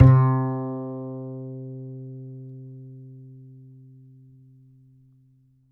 G, G string upright bass; Upright Acoustic Bass

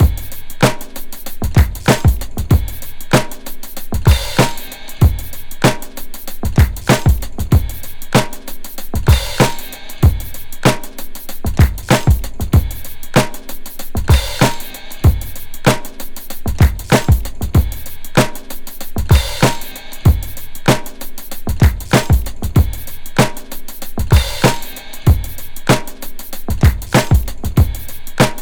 95.75 Slightly Bouncy Dustbin, breaks; Lockdown Breaks

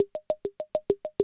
CR-78; Sound & Vision - Gigapack II

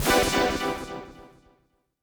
Synth C, Synth Prophet One Shots; Electronisounds

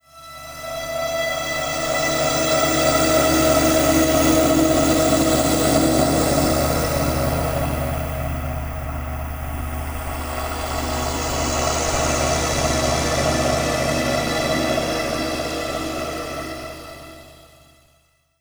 Morphant C, Atmospheres; Zero-G Ambiosis

